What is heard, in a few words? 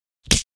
Slap